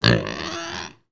Pig squeal

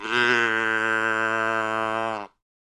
Cow mooing close up

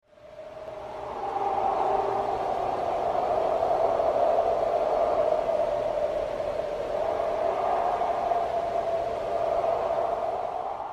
Village ambience light wind

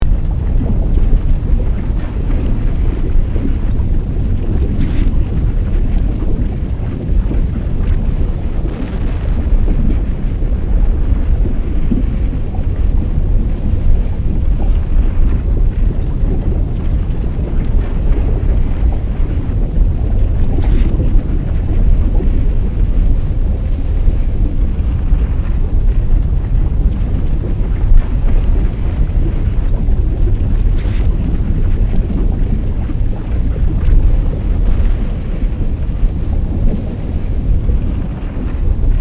Volcanic lava lake close ambience loop; nature

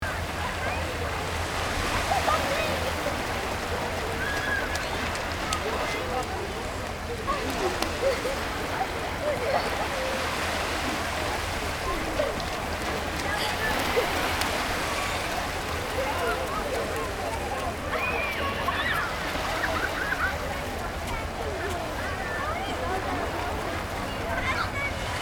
Seaside playground sea waves loop

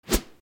Tennis racket fast movement swoosh

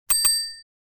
Bell notification

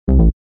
Error notification alert

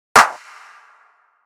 E Clap single

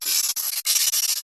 Electronic static and fizzle short

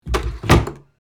Hotel door close

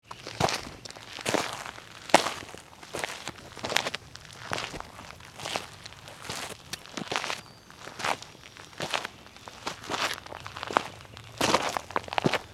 Footsteps walking on sand with pebbles sneakers

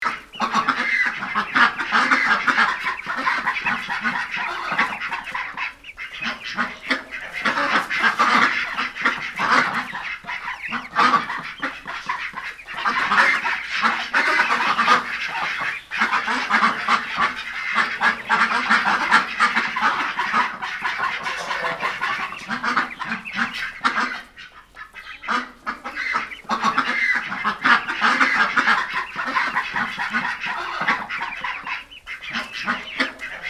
Farm pond ducks ambience

